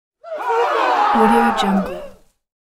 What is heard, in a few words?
human group cry out